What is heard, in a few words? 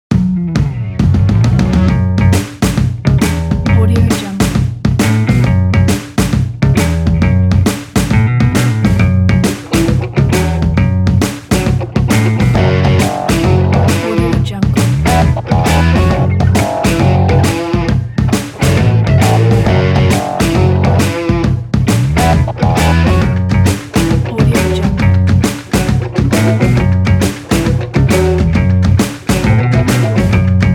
happy music